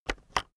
keyboard external enter press slow

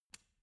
switch turn on soft

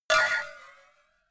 cowbell clank hard

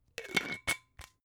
glass bottle massive close

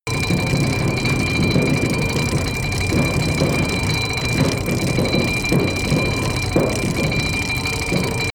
robot movement run roll

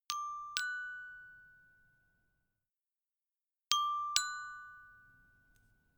notification glockenspiel third up

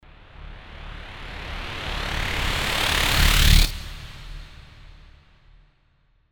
beamer

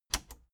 plastic button latch scratch enable fast

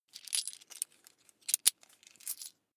coins clatter soft

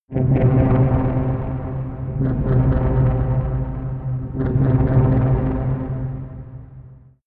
cosmic alien

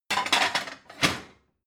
plate multiple shelve hard

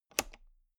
toolbox latch close loose regular

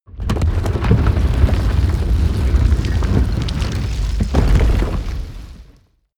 gate stone medium close

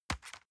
envelope drop multiple medium

